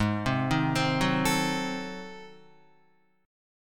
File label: G sharp 7th Flat 9th G sharp down Guitar, chord; G#7b9; arpeggiated; JGuitar